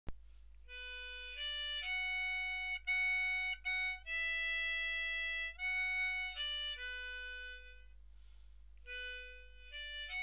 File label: Harmonica